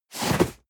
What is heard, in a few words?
cardboard box medium slide hard; objekte